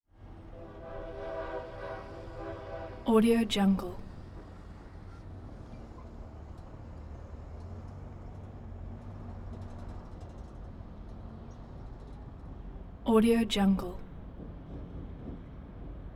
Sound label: locomotive switcher train in